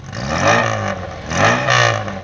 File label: vroom